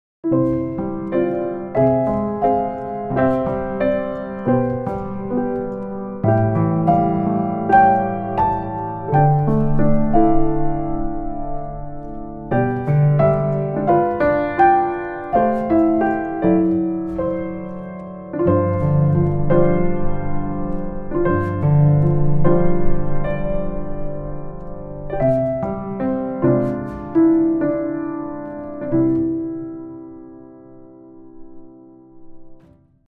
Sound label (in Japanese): カエルの歌ショパンコード, ピアノ; ピアノのある生活